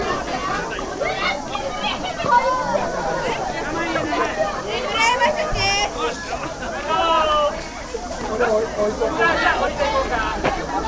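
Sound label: town summer fest; event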